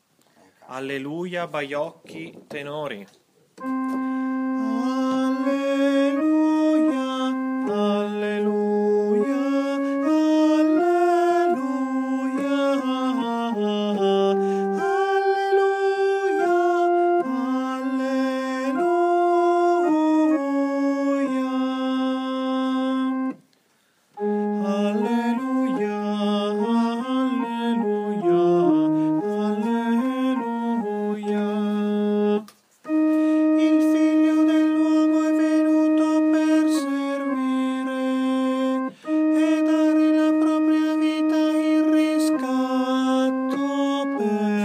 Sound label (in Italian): TENORI